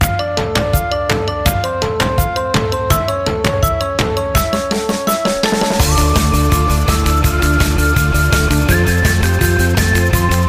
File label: ringtone; gravity falls